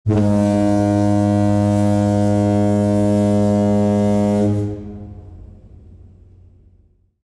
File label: Ferry Fog Horn